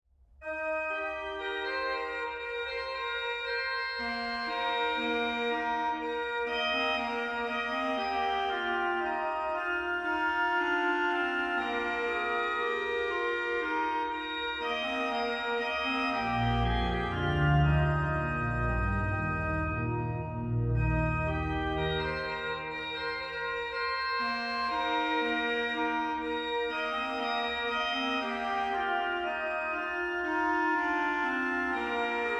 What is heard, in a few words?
fanfara